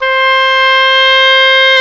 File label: oboe, mf f; Roland L-CDX-03 Disk 1; Oboe 2&3